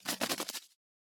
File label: Bird Wings Fly Away; SoundsCrate